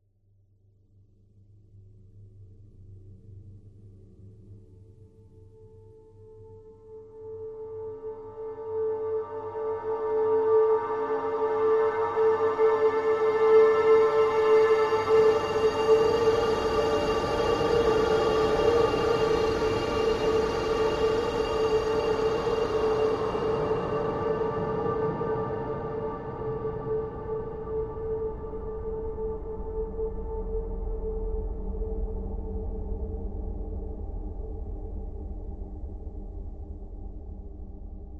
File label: ambient